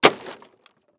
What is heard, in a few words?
Impact Wood Crash Short; Impacts